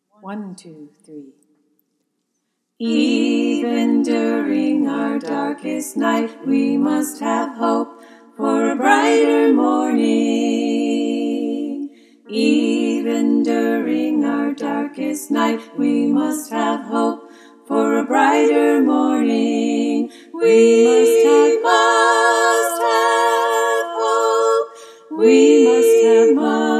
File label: All parts, choir; freedom choir